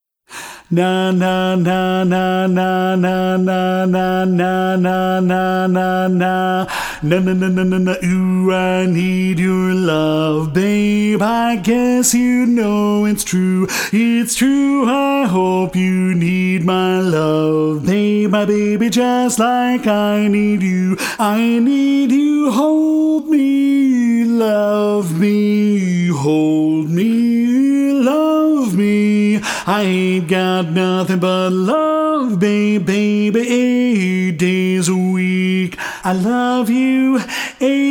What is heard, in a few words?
Bass Alone